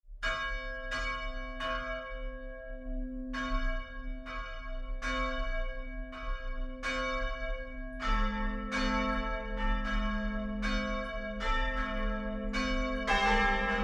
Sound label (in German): Glocken; st markus hoheluft